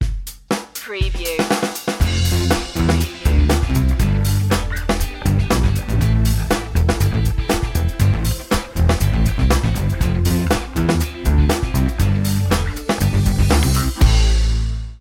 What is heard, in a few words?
funky time